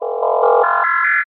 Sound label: Suit Intro SFX